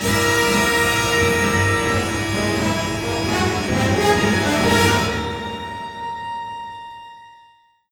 Sting

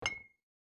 Jam Landing; my cute commissar 2020 gamerip

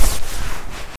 Clothing; 1916 der unbekannte krieg gamerip

